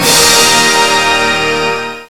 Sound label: orch hits; Rubber Chicken Software Sample Bank